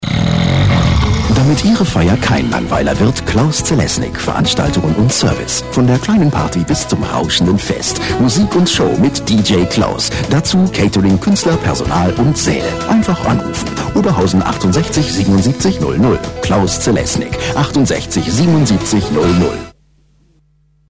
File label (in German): Werbejingle